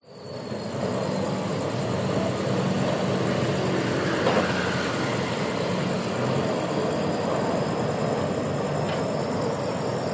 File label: industrial construction distant digger exhaust bangs occ vehicle pass night insects